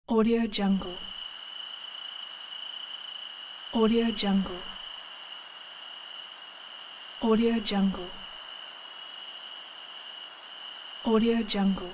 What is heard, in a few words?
summer night grasshopper and crickets atmosphere